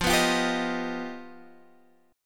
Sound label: G Augmented 7th